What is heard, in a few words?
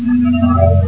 teleport